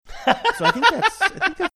laughing

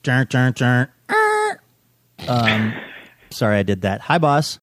donkey sound sorry i did that hi boss

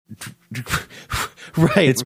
making funny sounds saying right

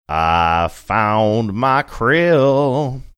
singing i found my krill

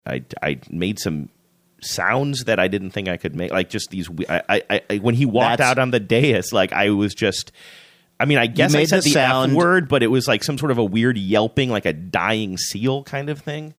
made sound yelp dying seal

